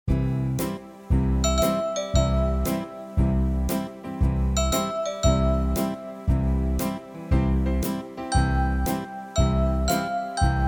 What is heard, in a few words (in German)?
Klinggloeckchen